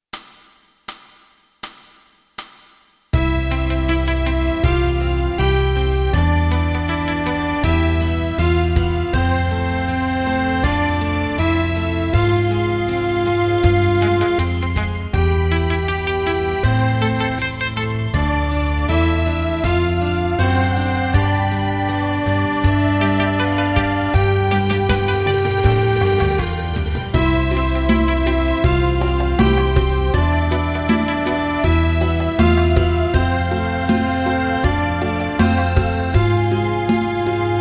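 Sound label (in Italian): Una volta, Fisarmonica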